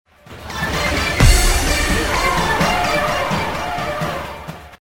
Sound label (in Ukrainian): Джингл; Таврійські Ігри; для смс